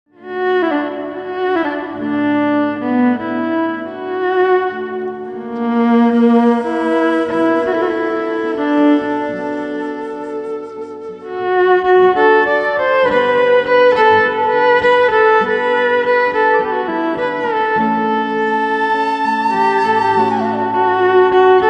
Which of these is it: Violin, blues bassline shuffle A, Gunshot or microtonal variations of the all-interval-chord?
Violin